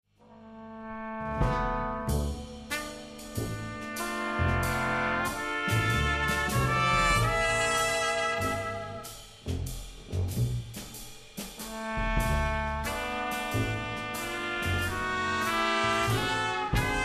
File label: Jazzz